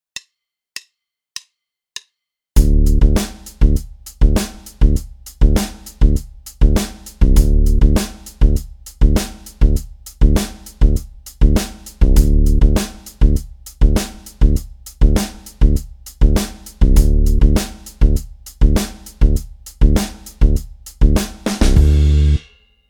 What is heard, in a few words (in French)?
debutants basse batterie